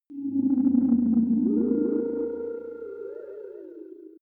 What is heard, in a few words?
galaxy calls